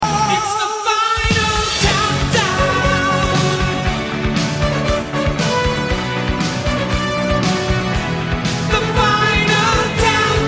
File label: win